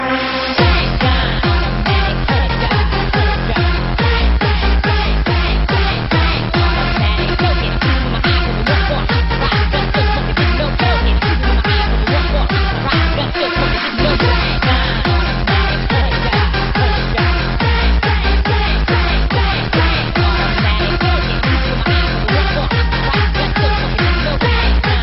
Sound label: Club Mixx